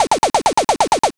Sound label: machinegun